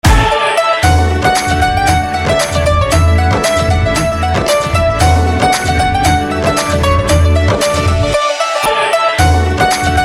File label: Guitar Instrumental Ringtone